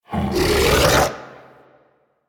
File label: Monster Spit Inhale; Warframe; Infested